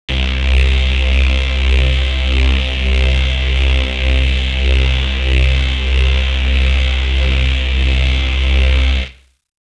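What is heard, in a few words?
heavy gravity power domain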